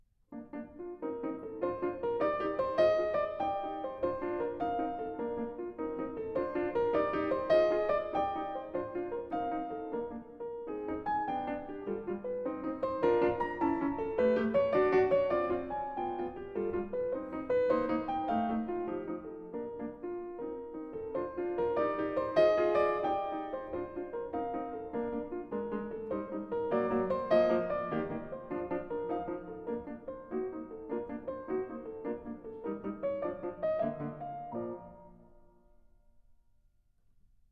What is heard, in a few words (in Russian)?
novaya kukla (fortepiano); p chaykovskiy